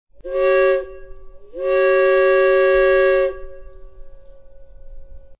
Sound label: whiz, whistle